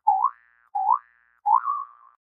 hop sound; dyslexia; ka ka ki kii

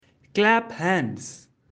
clap; grade1; english; wave your hands

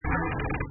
door open; grade5; reading diagrams

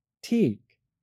correct; grade3; units of time

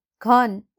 Hammer; grade1; nepali; adha akchyar

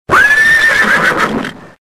horse; grade1; sound sci

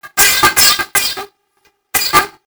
cough tuk tuk; dyslexia; 3 pangre ra 6 pangre

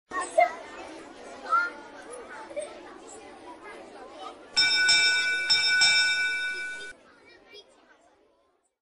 children bell ringing

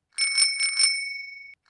cycle bell; grade7; distance displacement

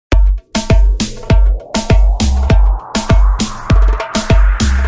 drum; grade1; english; making words

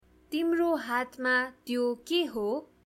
flute

